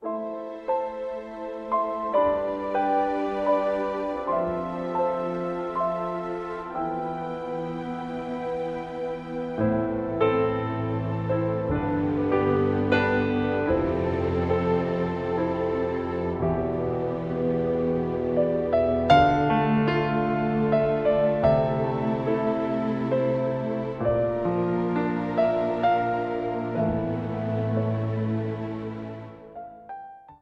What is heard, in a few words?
babys lullaby, piano hymns; be still piano hymns